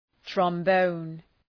trombone; {trɒm’bəʋn}

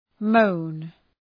moan

